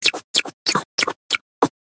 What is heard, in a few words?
lick